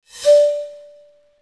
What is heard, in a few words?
Panel Up